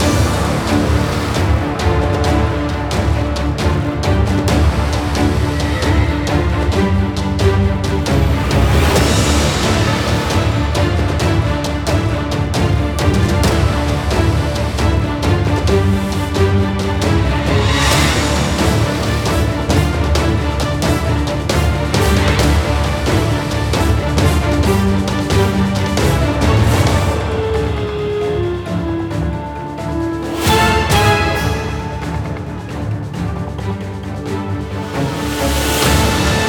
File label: bgmusic high combat; Iron Marines